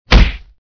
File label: punch